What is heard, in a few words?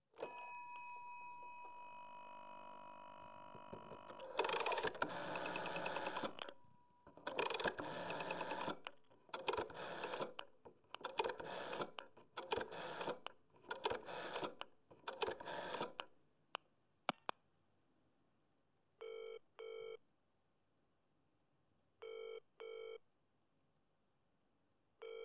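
rotary telephone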